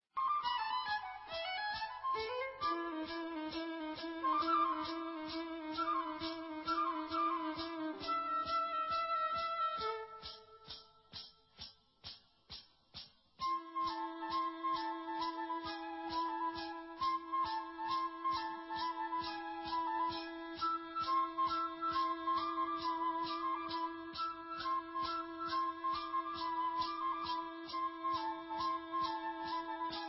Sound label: tarantella; tarantella naif